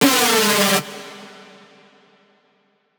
synth screech oneshot F#; Synth Hits; GhostHack

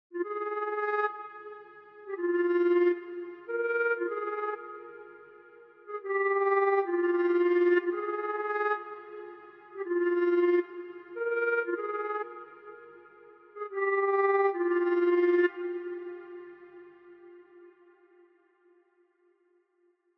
125 BPM F Min Oboe; Melody; Loop Stems; Cymatics; Sparkle Up; 2022 Melody Collection Platinum Expansion

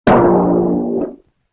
Bass Drum Rim Shot; Vintage Cartoon; Drums & Percussion

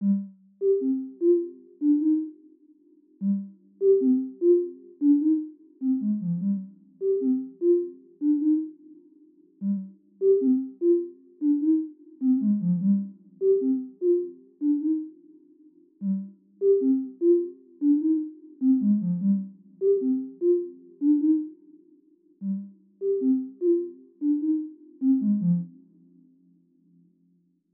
Bell, 150 BPM C Min; Cymatics - 2022 Melody Collection (BETA); Wavy; Ganja

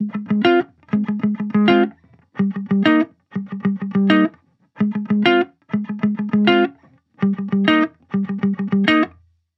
Funk Chord Loop, 100 BPM F Min, Guitar; Guitar Loops; Future Bass; Instruments

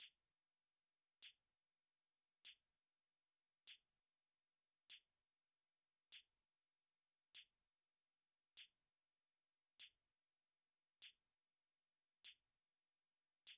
Drum Loop - 98 BPM Shaker, Afrobeats; Loop Stems; Cymatics; PARADOX II - Drum Loop Collection; Artist Drum Loop

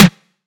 Terror Tom, Dubstep, Percussion; Drums; One Shots; Cymatics; Dubstep Starter Pack

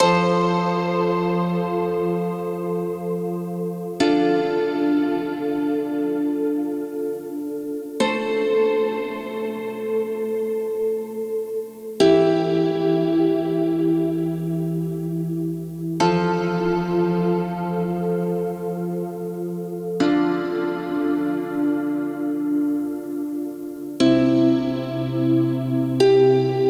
Harp - Fm 60Bpm; Construction Kits; STEMS; Ghosthack; SkyRealm; Kit 04 - High Rock View

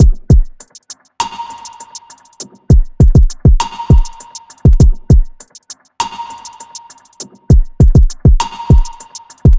Drum Loop 100 BPM; Eclipse; Cymatics; Vibes II Drum Loop Collection

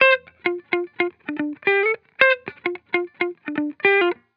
Vibrations Pop Guitar Loop 110 BPM F Maj; Cymatics; Vibrations Guitar Sample Pack

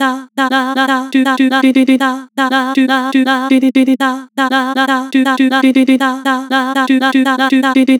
Vibrato Chop, Harmor Vocals

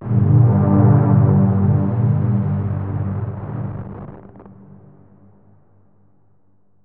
Tonal FX Gm 70 Bpm, Granular Tonal FX, Lo-Fi Kit; HipHop; Ghosthack; Advent Calendar

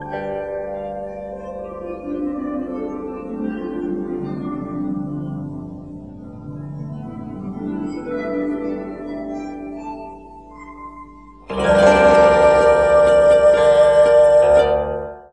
fx harp; Cartoon - film effects

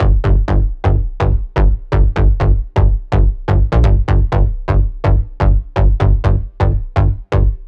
Bass Gm 125Bpm, Techno; Bass Loops; Pressure; Ghosthack Advent Calendar - Day 6 - Techno Kit

